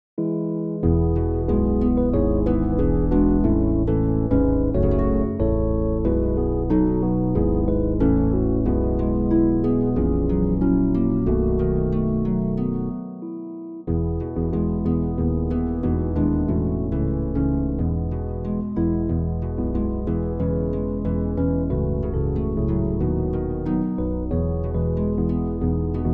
backing; New Hope Music

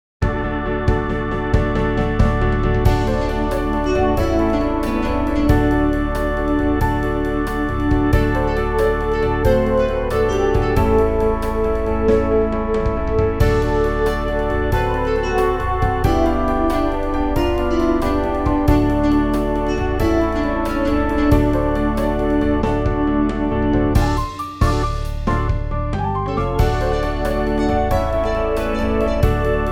blues instrumental; New Hope Music

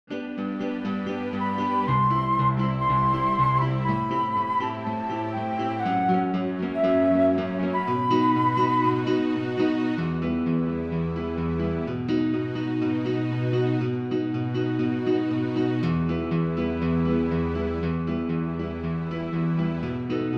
backing; New Hope Music

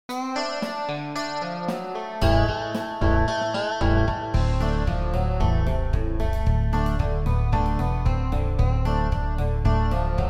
country backing; New Hope Music

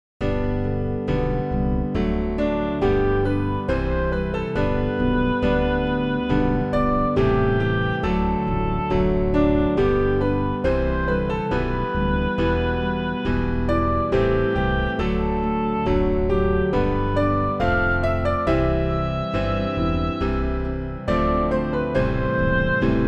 instrumental; New Hope Music

